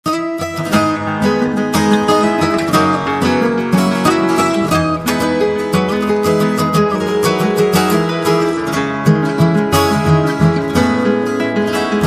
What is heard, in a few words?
theme film audio track; Android; VideoEditor